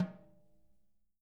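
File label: jazz snares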